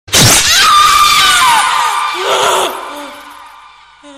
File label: Horror